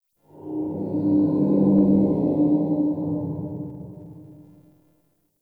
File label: Exterior Distant Calls Angry; Ambience; Duviri; Warframe